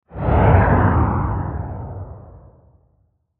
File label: Whoosh Dark Heavy Flange; Blastwave